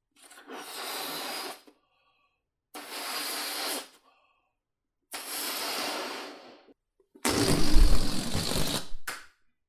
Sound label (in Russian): sharik naduvaiut i vypust